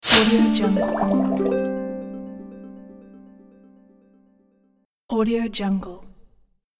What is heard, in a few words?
spell of magic potion